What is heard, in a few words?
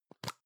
plastic can lid screw open medium; objekte; dose